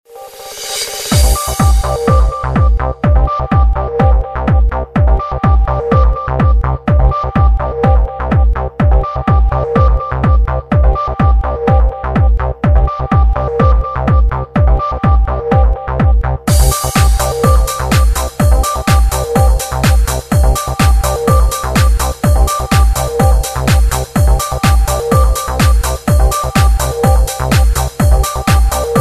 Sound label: Super Dance Sound